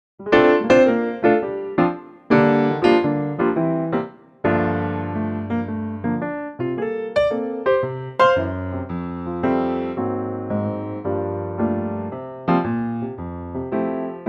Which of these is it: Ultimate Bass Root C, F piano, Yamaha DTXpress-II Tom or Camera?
F piano